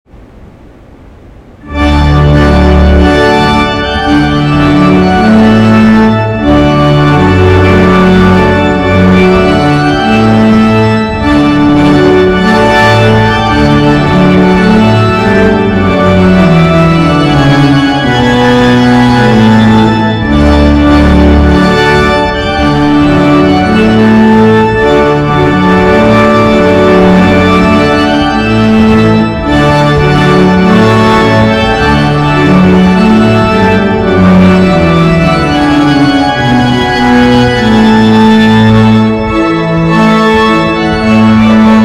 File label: Trumpet Tune; Trumpet Tune in D – Purcell